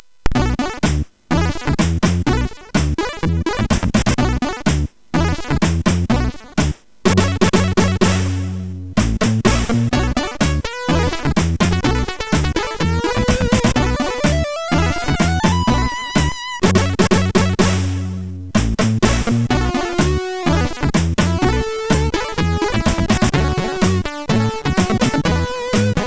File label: JAZZZZ, MOS6581; MOS6581R4; hvsc